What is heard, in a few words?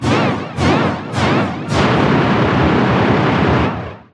air feeding